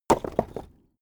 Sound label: stone small impact